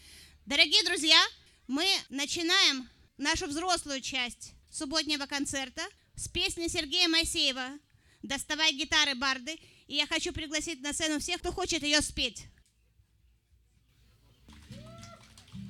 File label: Chorus - Intro